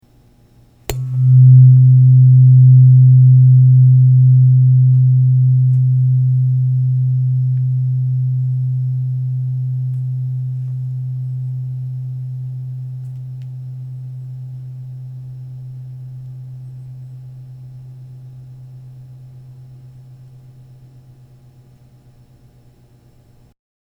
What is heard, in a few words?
biosonics c 128 hz otto tuning fork